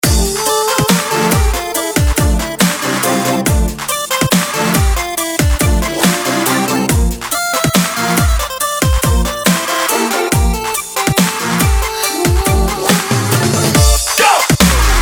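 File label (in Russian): забавный дабстеп рингтон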